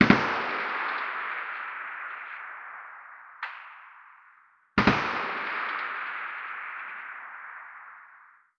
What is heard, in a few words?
combat atmosphere; World of Tanks